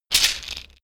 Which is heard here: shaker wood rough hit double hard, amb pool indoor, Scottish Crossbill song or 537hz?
shaker wood rough hit double hard